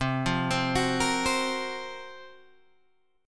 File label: Gb Major 7th Flat 5th